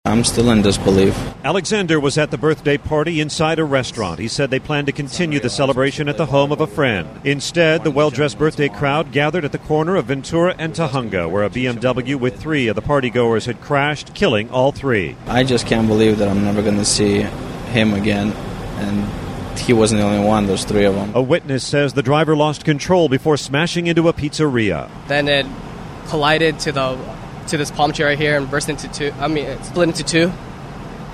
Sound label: crash